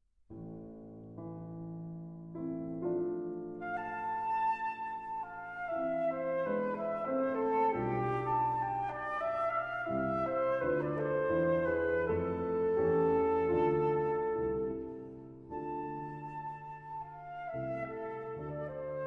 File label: Romances For Oboe and Piano, Nicht Schnell; Three Romances Op. 94